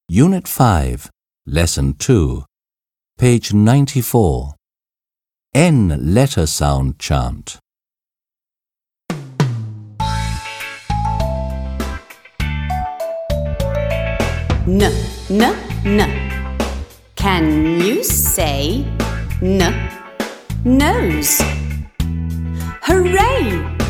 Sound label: N Letter Sound Chant